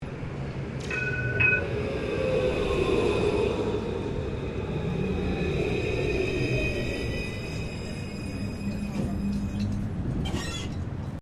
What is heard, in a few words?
Tram Melbourne C Class Stopping; Various Melbourne trams; Streetcars; Vehicles